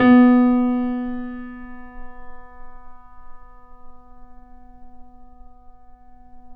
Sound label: Mp B 2 off, Grand Piano off mic; AKAI S6000 CD-ROM - Volume 2